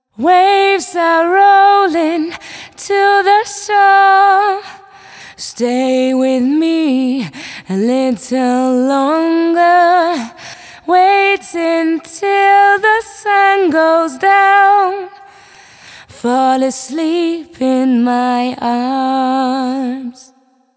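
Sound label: Waves, chillout vocal sample; сэмпл вокала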